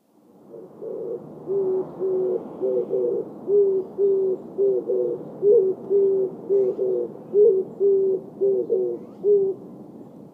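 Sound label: Oriental Turtle Dove, Kokubunji; kijibato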